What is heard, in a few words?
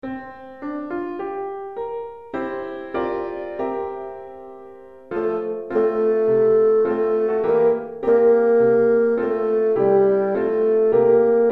Bassoon Piano